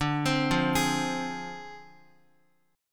D-Minor 7th Flat 5th, D, Guitar, 4F, 4A, 5C, 5G, 5C, 6E; arpeggiated; chordshape